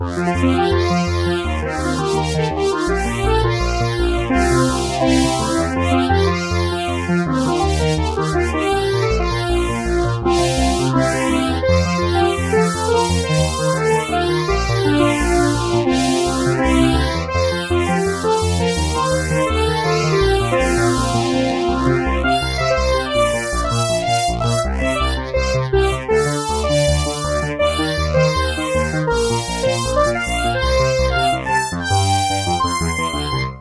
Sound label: reface cs; yamaha; mess arounds